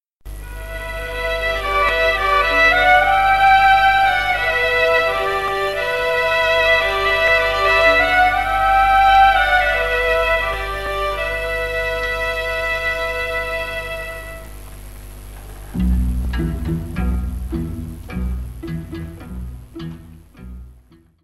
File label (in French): Gaida - Roumanie